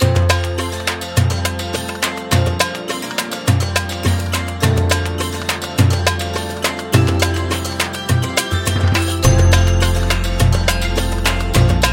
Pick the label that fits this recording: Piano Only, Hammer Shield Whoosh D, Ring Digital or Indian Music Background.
Indian Music Background